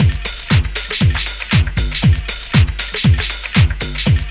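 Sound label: drum loop; X-Static Goldmine 3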